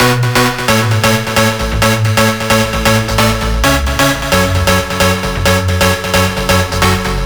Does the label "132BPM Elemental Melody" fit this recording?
Yes